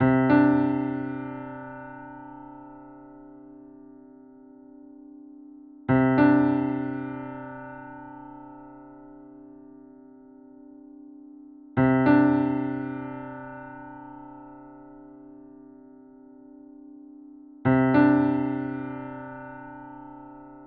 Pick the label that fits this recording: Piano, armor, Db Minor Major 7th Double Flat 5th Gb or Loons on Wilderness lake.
Piano